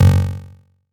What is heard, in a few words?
error